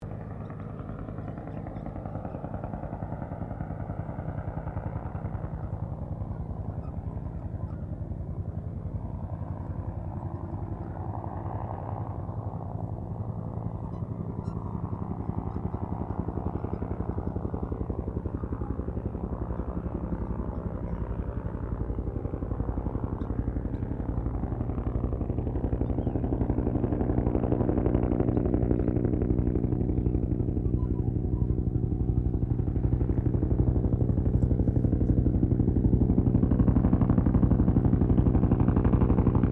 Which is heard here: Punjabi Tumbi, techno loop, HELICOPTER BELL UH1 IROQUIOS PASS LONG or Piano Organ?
HELICOPTER BELL UH1 IROQUIOS PASS LONG